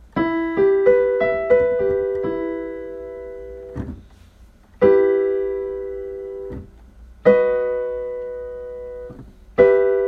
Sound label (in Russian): аккорды